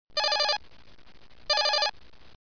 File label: detected; wow; Spy